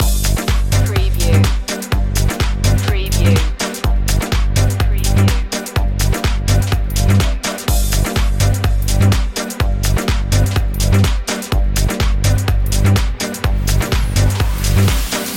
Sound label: bass loop